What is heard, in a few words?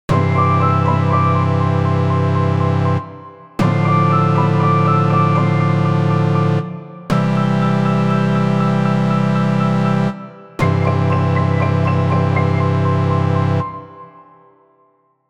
success stinger